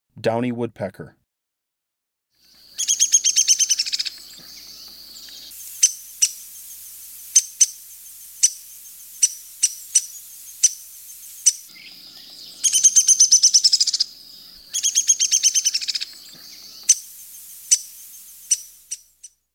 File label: Downy Woodpecker